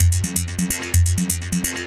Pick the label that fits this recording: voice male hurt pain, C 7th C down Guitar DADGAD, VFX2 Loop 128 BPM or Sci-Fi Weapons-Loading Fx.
VFX2 Loop 128 BPM